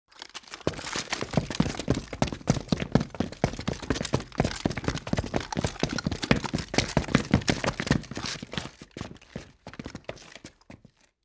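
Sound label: Footsteps, Group Militia Running with Gear Movement; Fairlight Sound Lib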